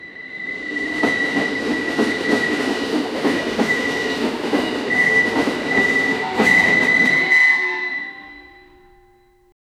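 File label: a classic train whistle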